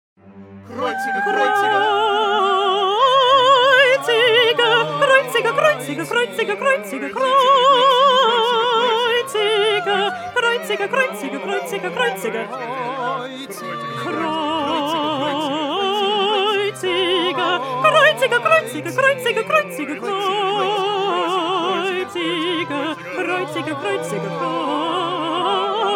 Alto; Bach; St John Passion

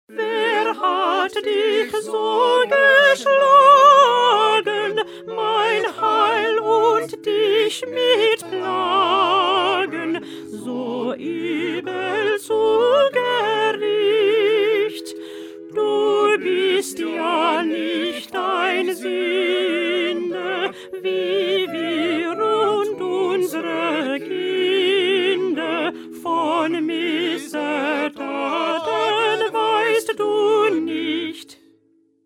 Alto

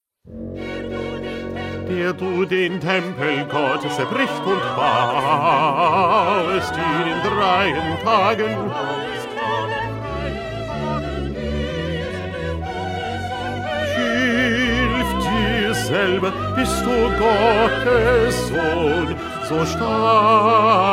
2nd Bass

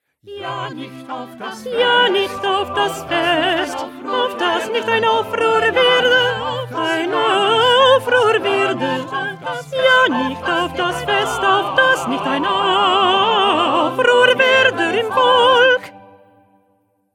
2nd Alto

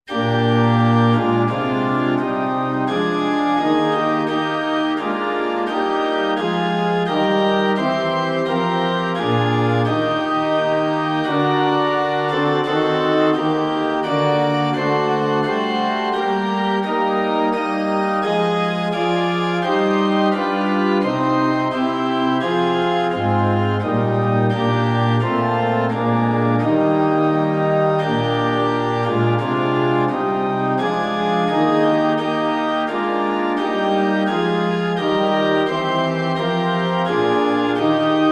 Instrumental

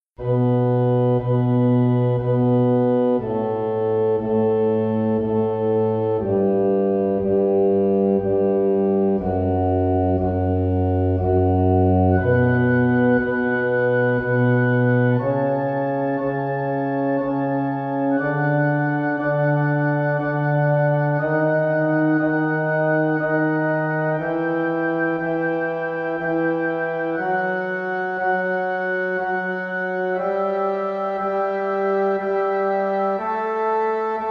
Magnificat Instrumental; Bach

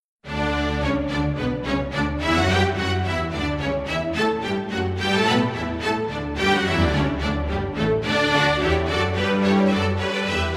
Nelson Mass Instrumental; Haydn

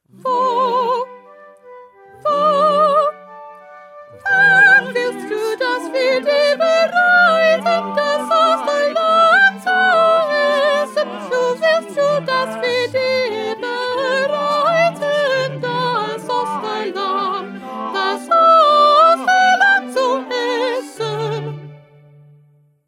Soprano

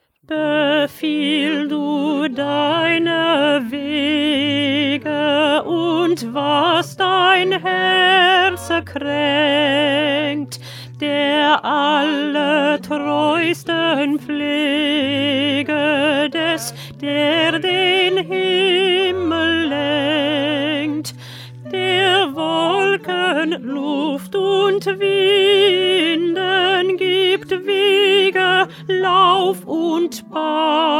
Alto; Bach; St Matthew Passion

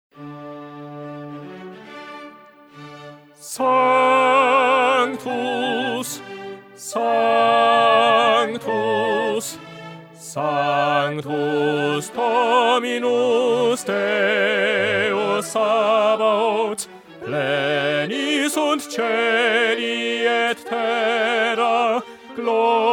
Bass; Schubert; Mass

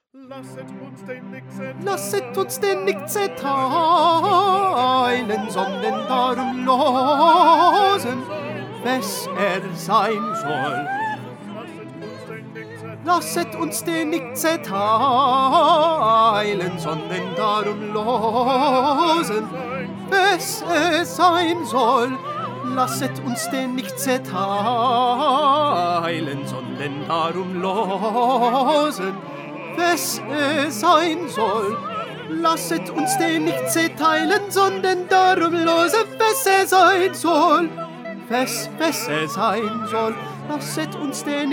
Tenor; Bach; St John Passion

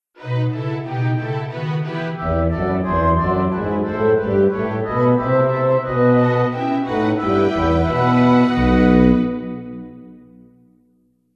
Instrumental

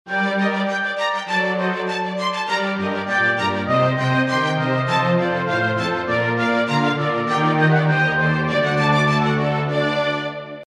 Instrumental; Bach; St John Passion

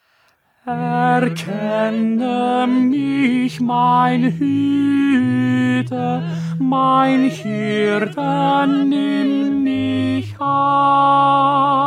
Tenor; Bach; St Matthew Passion

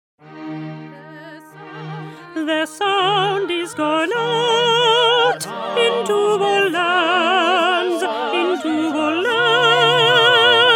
Alto; Handel; Messiah

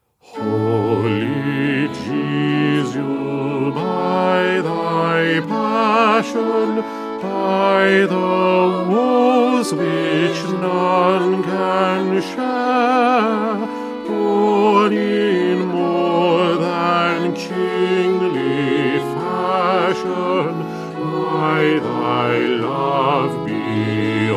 Bass; Stainer; Crucifixion

